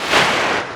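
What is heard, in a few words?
water in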